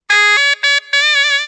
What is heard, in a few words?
zurn toot, Musical Instruments